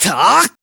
voice attack

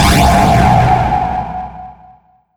battle start

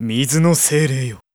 voice magic water

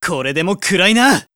voice attack

